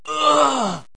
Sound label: die civilian